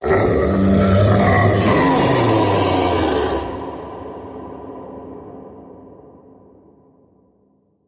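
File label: Creature Beneath The Bed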